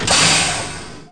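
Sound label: Emergency Brake, Train; PKP; OpenBVE; loose stock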